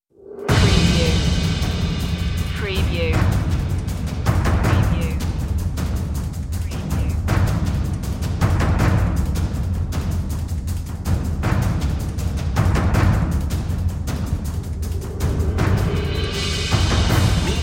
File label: percussion only version